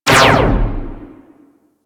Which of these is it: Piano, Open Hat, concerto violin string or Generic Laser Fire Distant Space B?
Generic Laser Fire Distant Space B